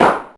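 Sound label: clap, DRUMMACHINES; DRMTRX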